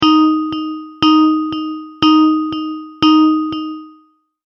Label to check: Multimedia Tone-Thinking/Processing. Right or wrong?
Right